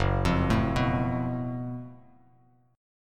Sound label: Gb Minor Major 7th Double Flat 5th, Bass Standard; arpeggiated; 2,8,7,4